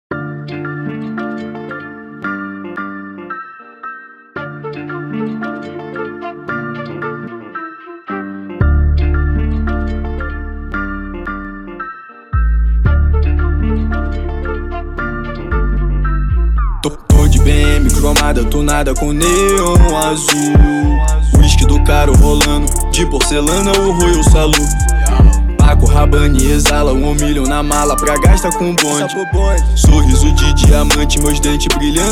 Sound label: trap